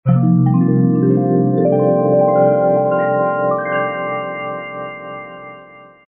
vibes soft rise

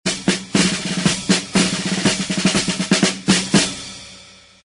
marching drum accent

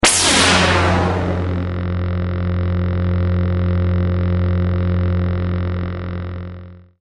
Light Saber Star Wars Sci Fi

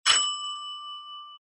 bell doorbell short ring

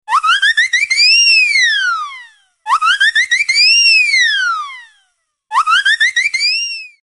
Cartoon Whistle Alarm

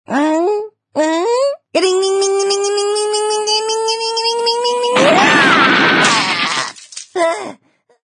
frog gets shot

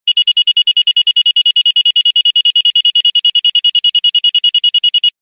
alarm piercing electronic signal

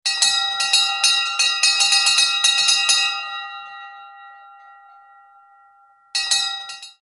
Retro Alarm Bell

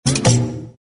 comedy have a nice trip

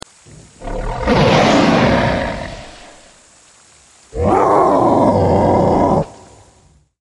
snarling carnotaurus

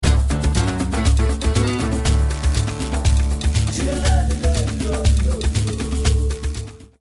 African Rhythm

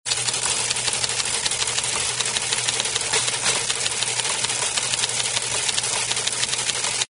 comm teletype high run

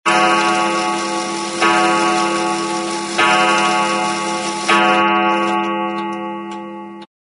spring wound clock chime

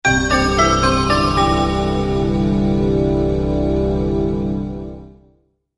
Elegant Chime Alert

